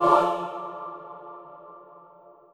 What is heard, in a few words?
choir